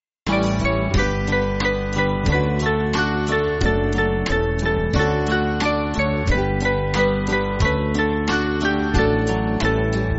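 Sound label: Band, Backing Files for Churches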